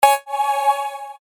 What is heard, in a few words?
C#, percussion; Holiday; revealed percussion vol 2